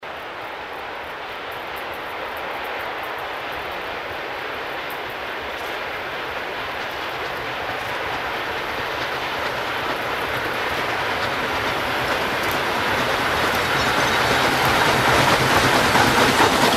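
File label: another approach and departure without whistle; звук паровоза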